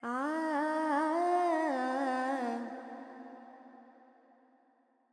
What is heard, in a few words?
Pentatonic, Vocals, Carnatic Female, Alaps, G; 70 G; Sample Banks